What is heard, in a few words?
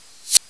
hud